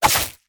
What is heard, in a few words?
Monkey; Rabbids Multiverse